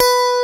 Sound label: B3 STRAT, BassGuitar; EL GT; AKAI S6000 CD-ROM - Volume 1